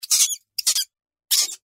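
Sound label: rat squeak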